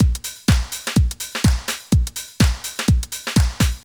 Kit Loops, Techno Trance

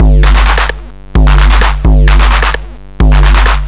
Crushed Beat 130BPM; musicradar

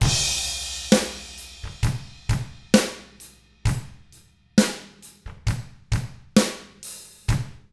SLOW ROCK, Drums; ILIO; Double Platinum Drums 1

